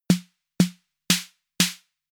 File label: Electro SD, Rhythm